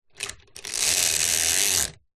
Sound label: household blind shade pull cord close up; zapsplat